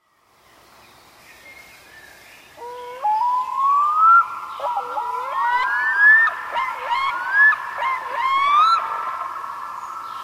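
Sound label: red-throated loon voice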